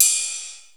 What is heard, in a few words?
Cymbal, EMU Proteus 3